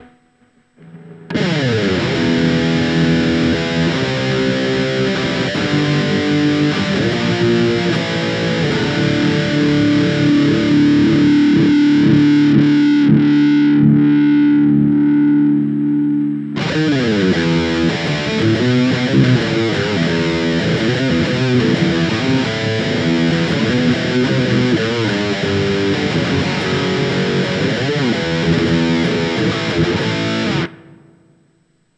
Distortion

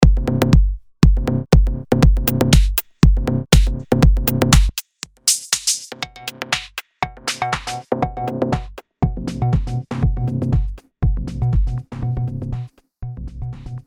Oberheim Bandpass Filter

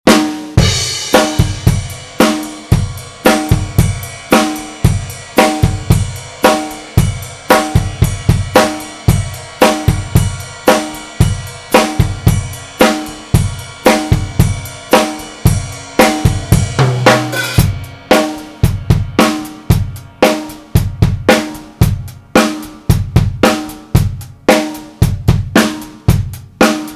Drums Mono 5057 Orbit; 3 Tracks; Trimm 1; Texture Red

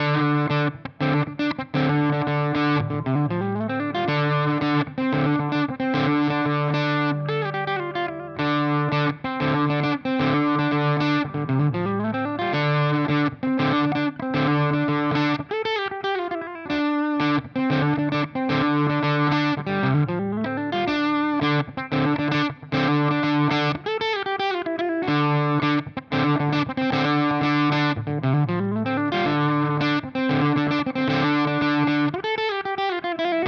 Germanium Fuzz Single Coil Low Gain; Benson

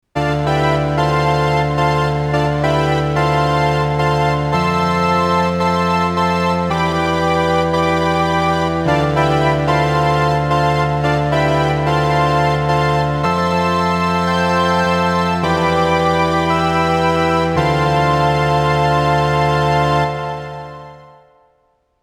Echo Fix EF X3 Stereo Echo

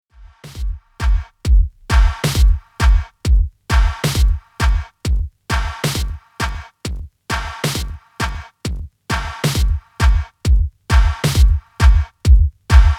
Master HPF Resonance Sweep

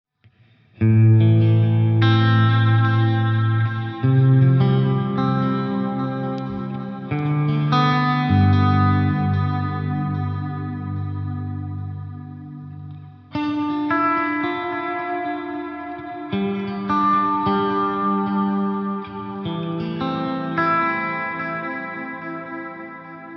Line6 DL4 MKII Delay; COSMOS

